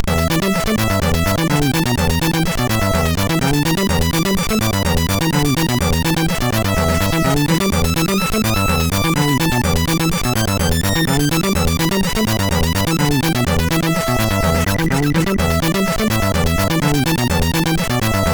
Slap Bass; SIDTunes

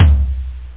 real tom; toms

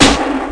snare fx; snare samples

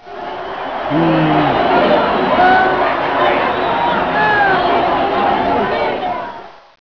Angry Stadium

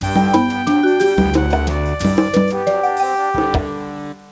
vibes; PUPPIES

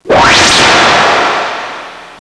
whish poo; Tony Quinn's Virtual Universe; Wayzata Technology

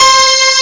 PIANO boost; PT Support archive

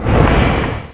enemy consumed; Ferazel's Wand Demo

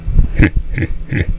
hehehe

